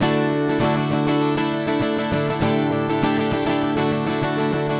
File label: fast; Magix Sound Pool 5 Collection